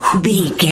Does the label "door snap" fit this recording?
No